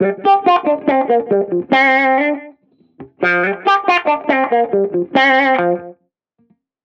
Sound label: Reggae Guitar Loop 140 BPM G Min; Vibrations; Cymatics; Vibrations Guitar Sample Pack